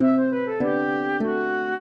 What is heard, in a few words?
trio, flute harp; Mozart